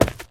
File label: tile, footsteps run